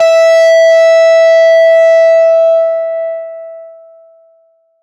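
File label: Plucked distorted E5; Acoustic and Electric; Polyend; Simulacrum Sounds; 1 Shots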